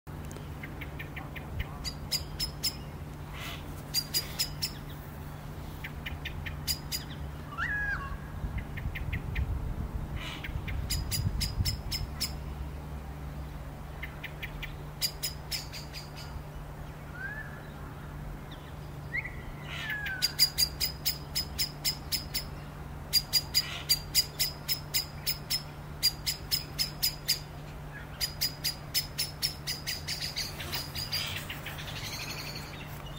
Turdus merula female, صدای توکای ماده برای جلب جفت نر